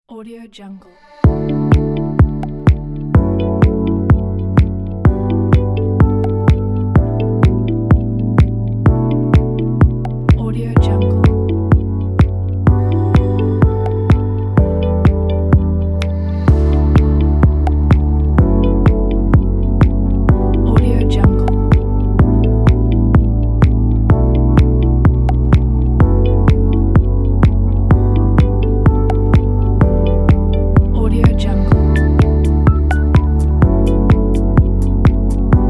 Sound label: experimental technology